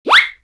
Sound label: whizz